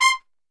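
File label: C4 trumpet shot, Brass Super Section; Best Service; TRP SHOT; 90 s Sample CDs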